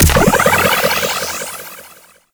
Team Health Restore Blast; Warframe

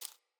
Rat Step, Foley

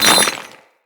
Melee Impact Cement

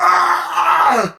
Pain; Warframe; Tau; Dax; Ashigaru

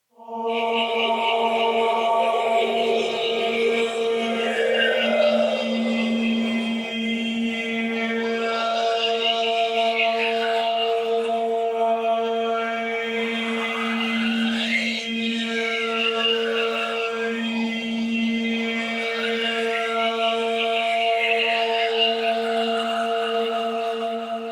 Choir; Warframe; Sentients

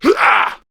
Pain, Male; Warframe; Grineer Male B; Battle Barks

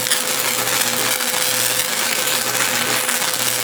Corruption Deposit Loop; Warframe; Zariman; Missions

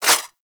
Throwing Star Whizzby; Warframe; Bullet Impacts; Whizzbys

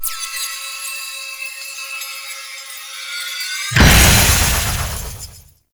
Despawn; Warframe; Duviri; Dax

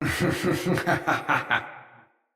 Laugh, Battle, Barks, Dialog; Mendicant Wazard

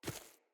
Battle Dog Walk Veg, Footsteps

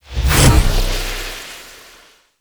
Rifle Void Mode Switch; Weapons; Warframe; Zariman

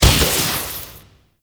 Vial Ability Corrosive Shatter; Warframe; Warframes; Alchemist; Elements

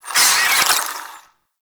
Infested Ashen Vocal Attack Death; Warframe

